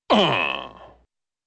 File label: grunts; kasplat